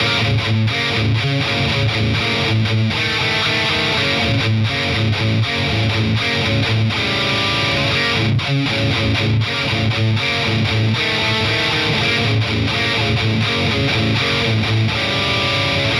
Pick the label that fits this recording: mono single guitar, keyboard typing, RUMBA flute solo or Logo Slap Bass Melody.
mono single guitar